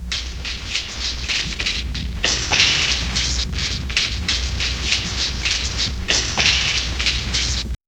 low murmured chants echoi

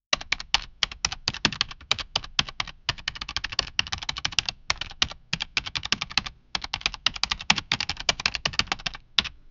slow and calm asmr keyboard

